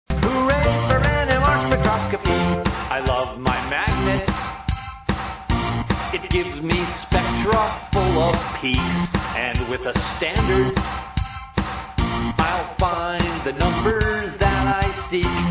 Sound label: Science Groove Hooray ringtone